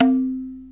"dhi", Tabla

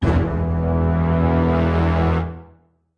orchestra hit melody kit; PADS and STRINGS

